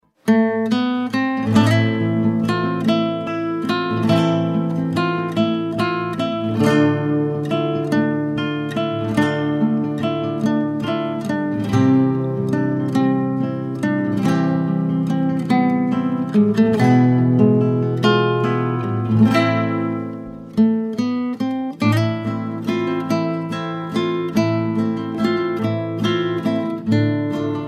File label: Guitar